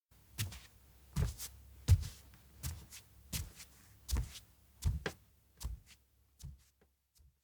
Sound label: steps indoor leave priest; honouden; anemoi パッケージ版 1.1